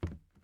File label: plastic step; teardown ps5 windows xbox series xs gamerip 2022